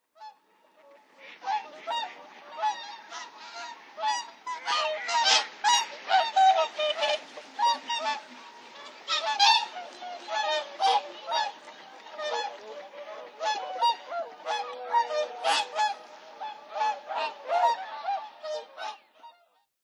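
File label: Whooper Swan; otawara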